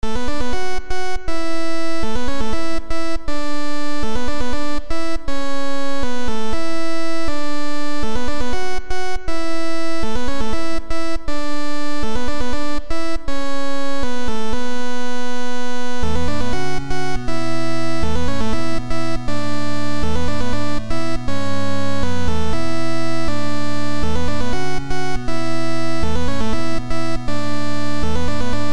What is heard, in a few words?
ByteBeat Basic